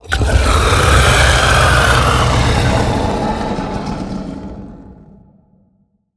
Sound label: Crabatoa Play